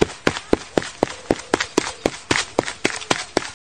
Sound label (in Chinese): 跑步; 走路跑步